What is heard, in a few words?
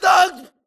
grunt death neck; Titanfall 2